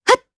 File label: Jump, jp; kingsraid